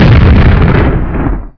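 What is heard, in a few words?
rocket explosion, weapons; quakemapping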